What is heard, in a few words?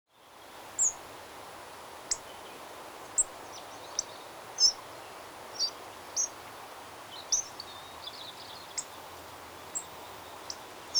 Hawfinch